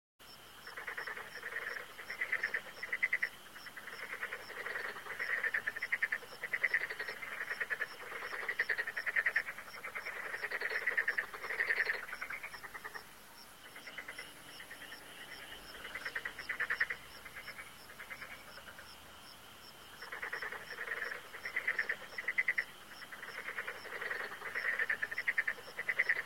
animal sounds frog

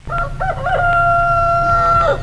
old roost

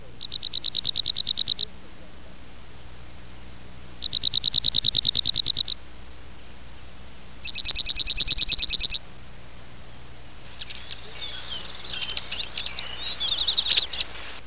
junco

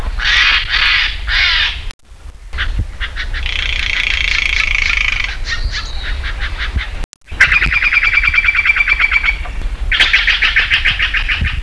Stellers Jay

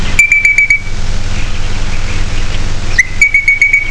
crimson rosella

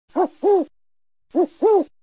Male Makinders Eagle Owl

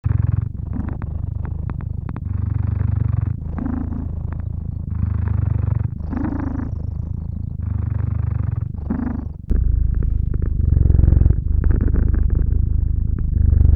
sleeping cat

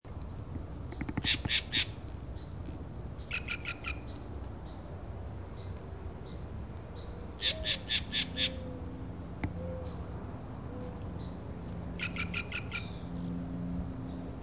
Green Jay

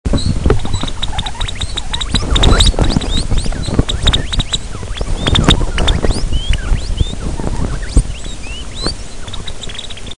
degu

